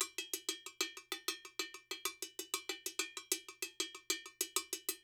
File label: NYC Percussionworks; Sampleheads; GJ LOOPS 96